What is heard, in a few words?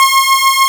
BRASS